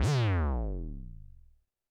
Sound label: Roland TD-20 Percussion